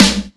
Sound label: piercing snare sample g key